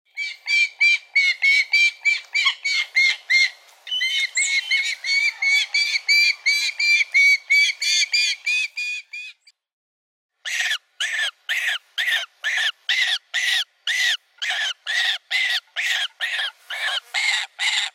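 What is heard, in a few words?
gyrfalcon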